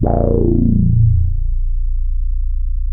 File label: A#1, Bass; E-MU Producer Series Vol. 6 – Analogia Project (CD 1); Boom da Bass 1